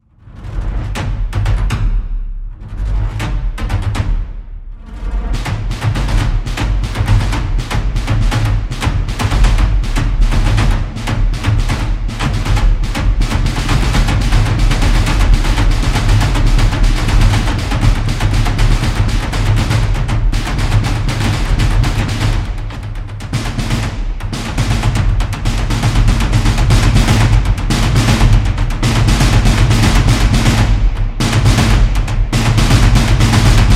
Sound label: drum track, cinematic drum